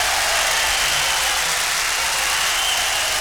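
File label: atmosphere, 160 BPM, drum & bass; Ueberschall; Drum & Bass Flash Trackz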